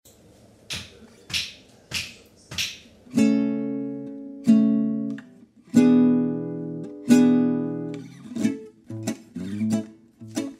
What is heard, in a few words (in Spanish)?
Guitarra Ritmica